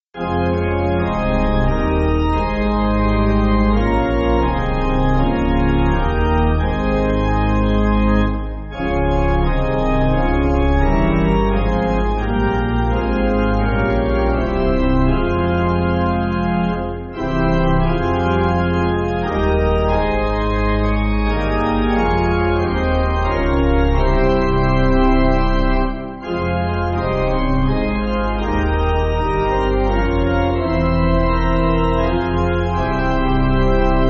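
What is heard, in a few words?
Pipe Organ, Backing Files for Churches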